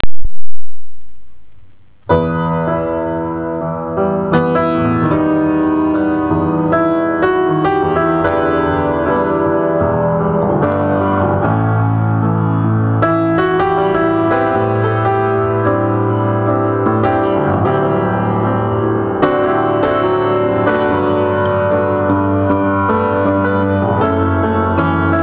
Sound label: Piano Composition